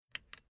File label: Car Classic Bentley Eight Dashboard Radio Small Button Controls; Transport